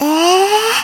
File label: combo break